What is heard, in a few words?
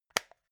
plastic remote battery compartment close